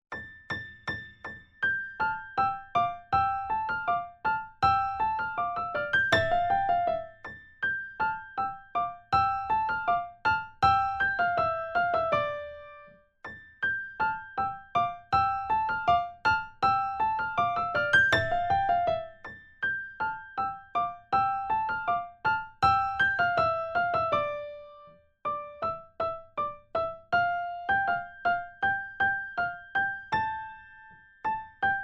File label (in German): Primo, Vierhaendige Uebungsstuecke; Diabelli